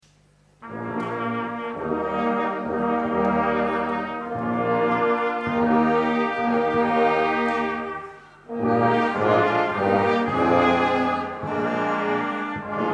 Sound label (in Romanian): Fanfara; Sambata Weinachtskonzert